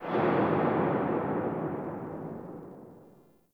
Under City Alley Cell Door Slam, Dungeons

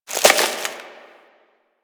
Tree Branch Break; Ambience; One Offs; Warframe; Quests; The New War; Drifter Camp

